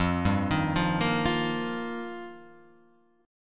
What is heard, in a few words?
F 7th Suspended 2nd Suspended 4th F